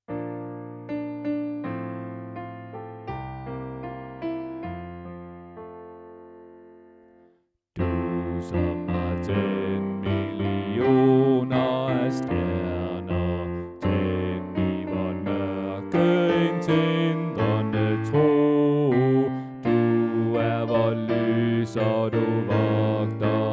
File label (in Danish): Du som har tændt millioner af stjerner Bas